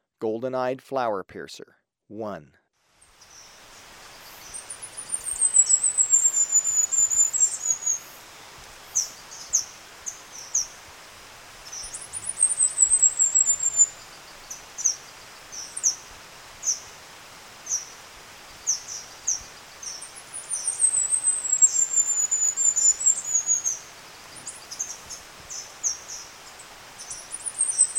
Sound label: Golden-eyed Flowerpiercer, Birds of Ecuador; S. America